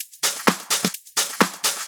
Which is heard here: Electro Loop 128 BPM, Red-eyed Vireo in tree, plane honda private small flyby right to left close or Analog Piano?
Electro Loop 128 BPM